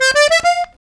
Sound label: Accordion Lik F; Zero-G Kocktail Kollection; Accordion Lix